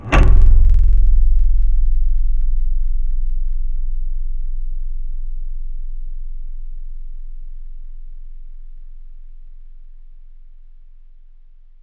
Acoustic Bright Mute Low; Synthetic's Serum Collection; Surge Sounds - The Sky - Serum Wavetables

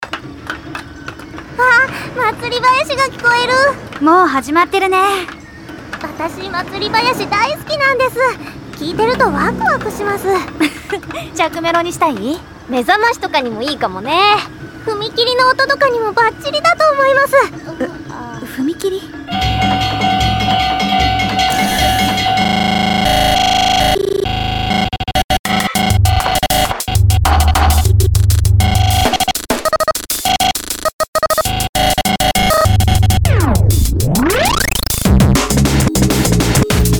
snares, lolicore

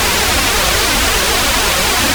J106 chorus

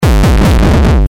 gabba kick remix; lolicore

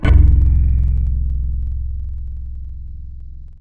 Japanese Guitar; Synthetic's Serum Collection; Echo Sound Works Sphere

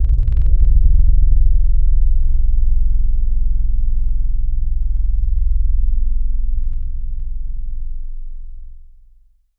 Clarinet; Synthetic's Serum Collection; XLNT-Skrilla Tables

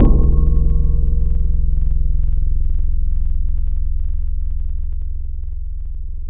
Hit, Tropical Mallets; Synthetic's Serum Collection; SparkPackers

